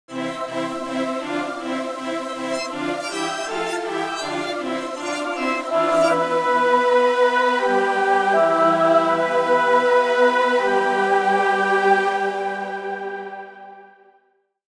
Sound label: chorus plain